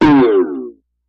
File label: Boing Down; boings